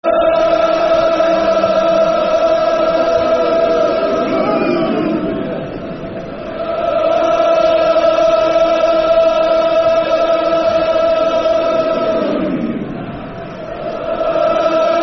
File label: Football Chants (Soccer Songs), Cardiff City